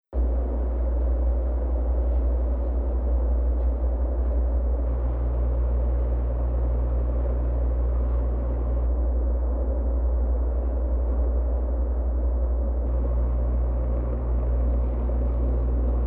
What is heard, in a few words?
noise